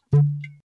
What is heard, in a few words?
Household Phones